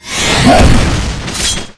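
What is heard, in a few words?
guardian sobek attack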